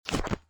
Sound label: drag sound